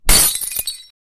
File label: light bulb breaking